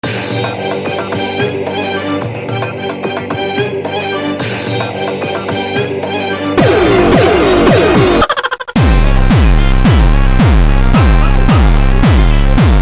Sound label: Jumpstyle - 128